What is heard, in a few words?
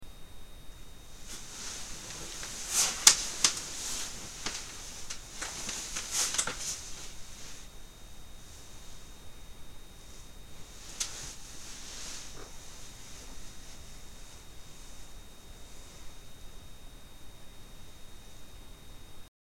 Cooler Beating